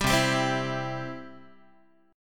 C Major, Guitar; strummed; JGuitar

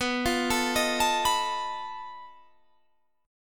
B 7th Flat 5th B, chord; B7b5; arpeggiated

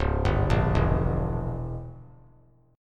Db Diminished 7th Db down; arpeggiated; JGuitar

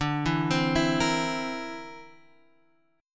D 7th Suspended 2nd Sharp 5th D, Guitar Open G

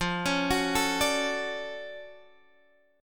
D-Major 7th F

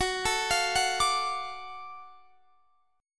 F sharp Minor Major 7th Sharp 5th, Guitar Drop D; chordshape; down

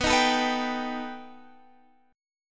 B Augmented 7th B down Guitar Standard; strummed; JGuitar

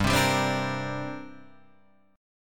D 7th Suspended 4th Sharp 5th, G

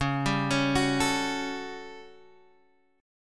A 13th Db, guitar chord

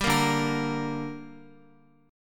D Suspended 4th Sharp 5th D down Guitar, chord

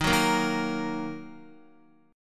Eb Minor, Guitar; Ebm strummed; JGuitar

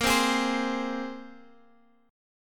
A sharp 7th Suspended 2nd, Guitar Drop D chord; x,13,10,13,13,x

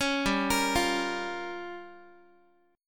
G Minor 7th Flat 5th, Guitar; Gm7b5; arpeggiated

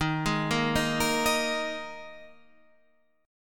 D sharp Suspended 4th, Guitar, Standard; arpeggiated; chord; 11,11,8,8,11,11; JGuitar

